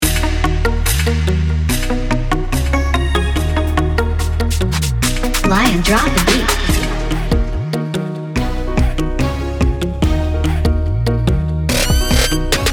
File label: Instrumental